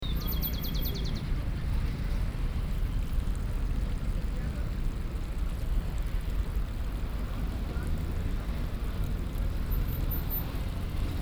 eau et oiseaux urbains; bogota

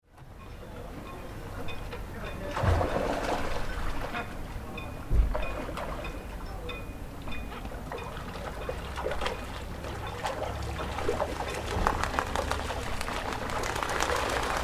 territoires inondables MAASBOMMEL biodiversite sonore

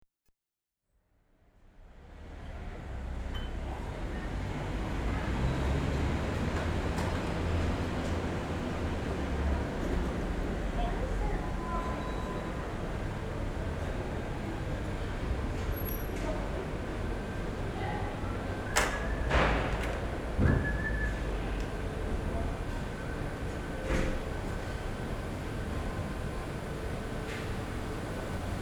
ambiances generees activite tertiaire; indicateur identite sonore

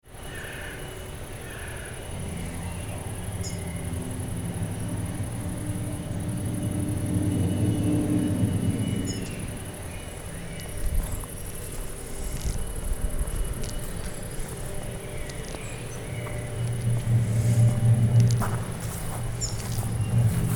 panorama nocturne

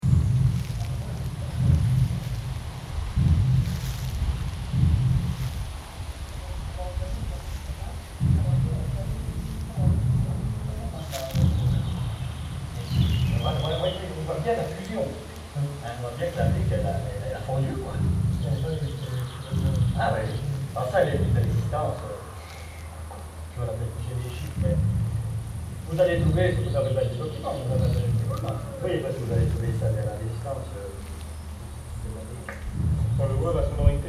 fusion

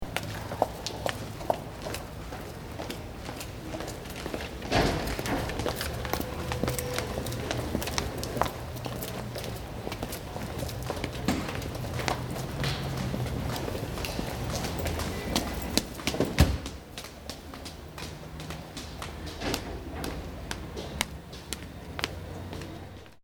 escaliers montmartre; sol urbain

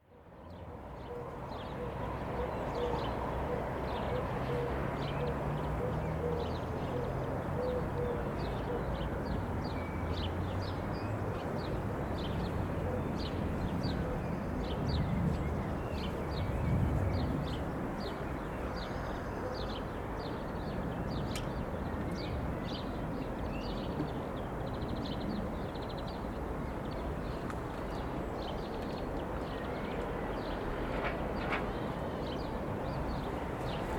cloche sommet; cartophonie

